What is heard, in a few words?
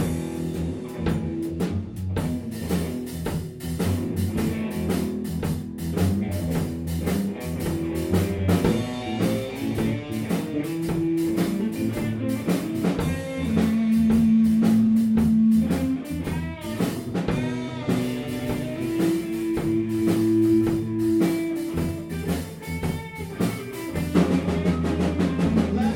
jam blues